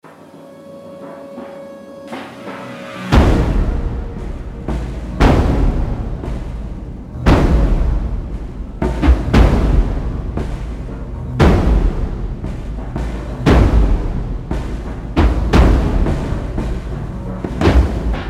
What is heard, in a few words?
Intense Drums and Battle Horns; The Last of Us; 30 Seconds Left